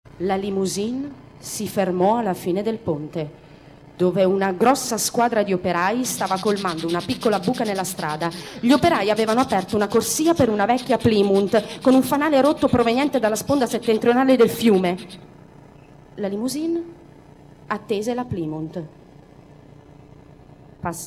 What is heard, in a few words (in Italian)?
piano meccanico